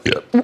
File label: burp fart; wendy williams